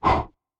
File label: bun swing